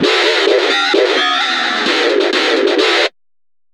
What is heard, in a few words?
GTR LOOPS